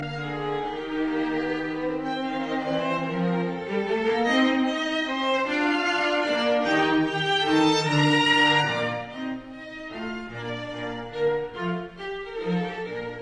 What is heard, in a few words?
String Quartet No 10 in B flat major Menuet; Haydn; Op 2 No 6